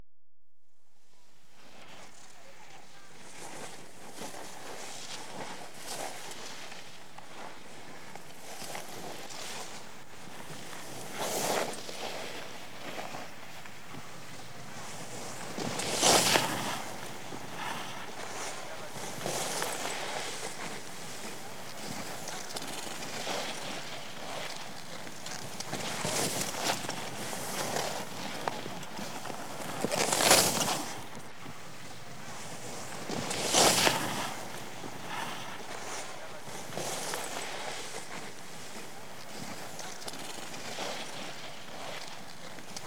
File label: Skiers Passing Right To Left; BBC Sound Effects Library; Adventure Sports